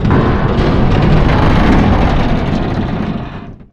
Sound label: Creak Large Metal Tower Fall; SFX Kit; Creaks, Scrapes, & Misc